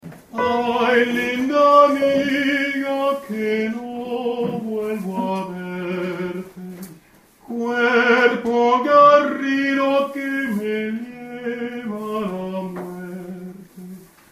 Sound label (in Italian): Soprani; Ay linda amiga